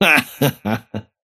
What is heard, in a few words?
wheel laugh; dota; kez